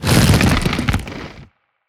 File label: bite